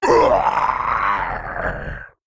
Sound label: Male Worgen Death